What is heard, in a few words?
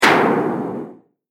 Heavyweight Explosion; Bomb Sound Effects; Impact / Explosion Sound Effect